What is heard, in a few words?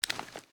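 foley assault rifle akilo47 grenade launcher reload twist; weapons; viper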